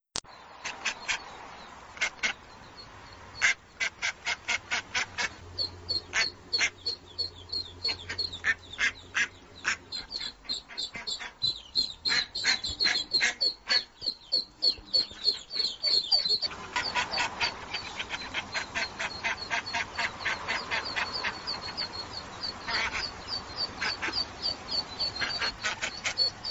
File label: Duck Call; Mother Duck Call